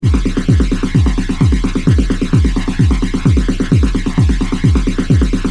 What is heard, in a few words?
drumloop ensemble